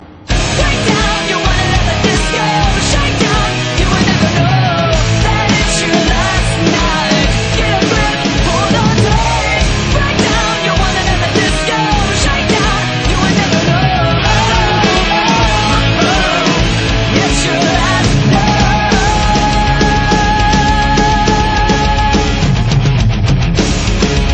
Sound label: disco; Fall Prey